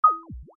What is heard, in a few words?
ding; Go Ronald Voice Interactive CD